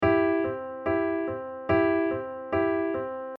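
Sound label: ballad pattern, accompaniment technic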